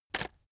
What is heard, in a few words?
chocolates take short